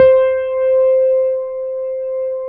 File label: CP70